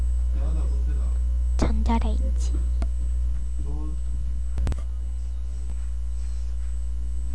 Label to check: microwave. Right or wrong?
Right